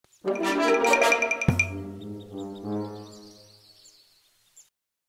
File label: Boar Jingle; Asterix at the Olympic Games